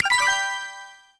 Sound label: Correct